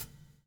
BUBL, BUBBLE; Spectrasonics - Backbeat

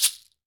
TANZANIAN, African Perc; E-MU Formula 4000 Series Vol. 4; Earth Tones

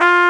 BUGLE F4, WIND; AKAI S-Series CD-ROM Sound Library VOL-2

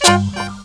SAX FX; USB Soundscan vol.27

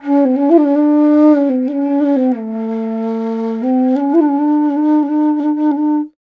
INDIA BASS, IND BASS

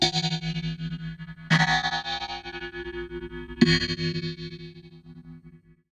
Ring Mod, Cymbal, contact mic; Murf & Ring Mod

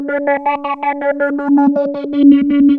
3100 DP C#5, Korg PS3100; Back In Time Records; Korg Universe VOL-1

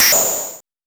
MOOG HAT; AMG - Vince Clarke Lucky Bastard

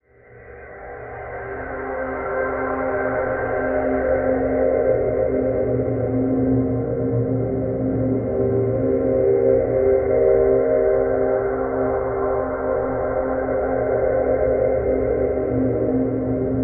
Deep Distance, Atmospheres; Zero-G Ambiosis

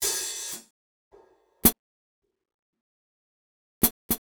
Lucidity Close Hats 110; Percussion Elements

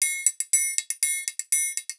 120 TRIAN, Percussion Loops

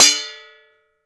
Pan Clank, household rhythm samples Hits

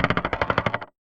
Corrigated Iron, contact mic, Marbles; musicradar; contact mic madness; Raw

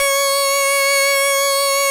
FARFISA C#5; Greytsounds

